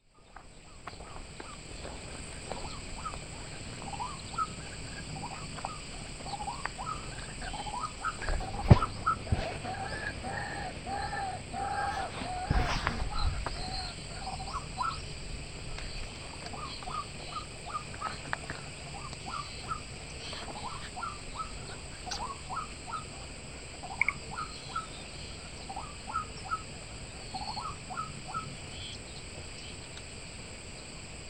シロハラクイナ 鳴き声; 鹿児島県大島郡龍郷町; bird song

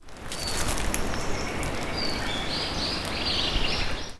akakokko call, bird; アカコッコ; 東京都八丈島八丈町 亜熱帯林; bird song

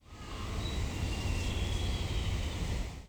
simaguma, bird song; シマゴマ; さえずり; 新潟県新潟市中央区西船見町 海岸林; J-eco 鳥のさえずり図鑑

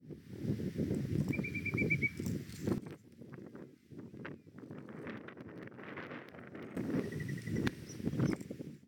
チュウシャクシギ 鳴き声

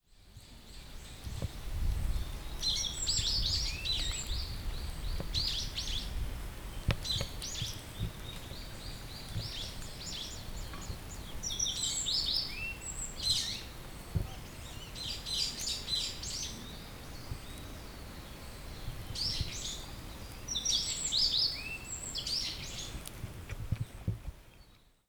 noziko, bird song; さえずり②; 新潟県新潟市秋葉区 大沢森林公園

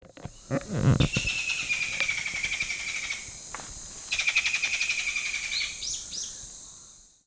ヤンバルクイナ 鳴き声

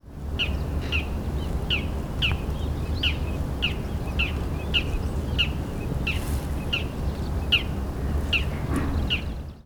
mozu, bird song

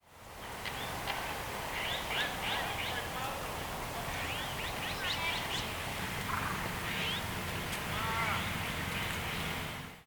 onaga, bird song; オナガ; 新潟県新潟市中央区 鳥屋野潟公園

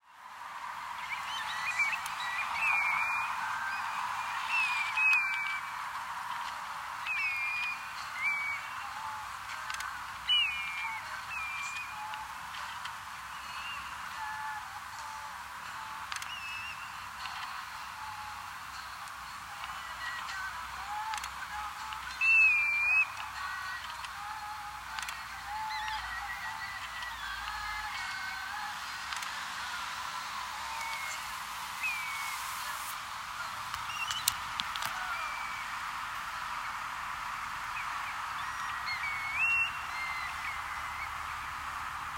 daizen, bird song; ダイゼン; 鳴き声; チドリ目; チドリ科; 沖縄県沖縄市 泡瀬干潟; J-eco 鳥のさえずり図鑑

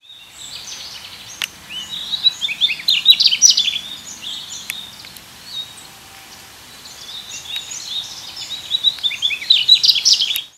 mugimaki, bird song; ムギマキ; さえずり①; 新潟県新潟市中央区 鳥屋野潟公園; J-eco 鳥のさえずり図鑑

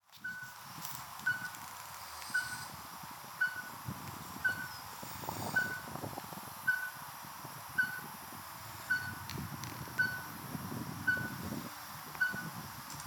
ヒクイナ 鳴き声; 東京都江戸川区 葛西臨海公園; bird song

